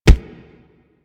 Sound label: Truffle Jugger Step Default